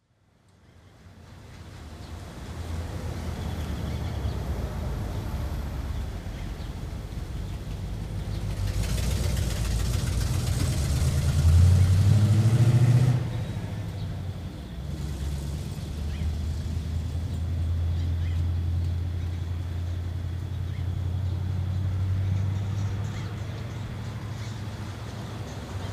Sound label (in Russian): zvuki dvora